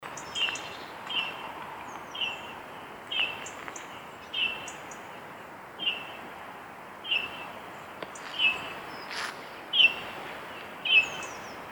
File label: Bullfinch